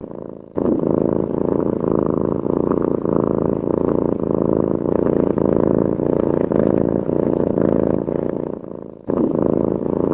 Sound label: Kitty purr